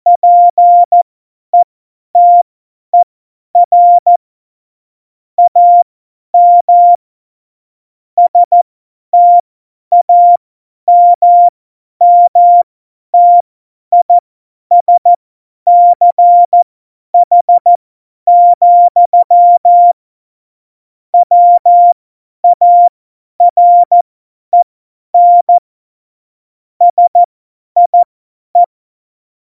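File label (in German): Witz, Tempo 50, Morsekurs, cw morsen; Witze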